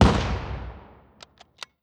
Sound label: infantry fire standing; Hearts of Iron IV Death or Dishonor